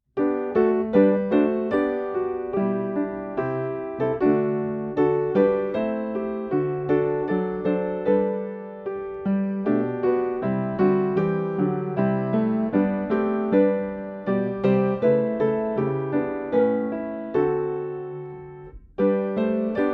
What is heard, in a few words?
Full Accompaniment; O Little Town